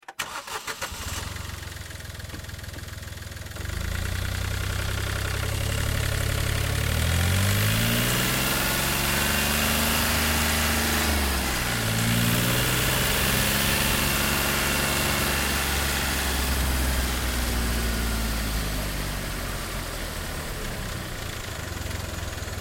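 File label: Car Smart Car Onboard 3PT; 2005 Smartcar Fortwo; Vehicles; Car Smart Car Ob 3Pt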